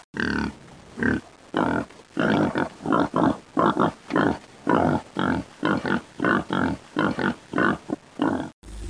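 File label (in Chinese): zhu jiaosheng, pig grunting; jiachu jiaosheng; jiaqing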